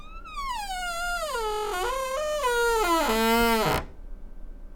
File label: Low Creak, Door; FXHome; Squeaking